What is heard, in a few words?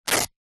fabric cotton rip; foley; zapsplat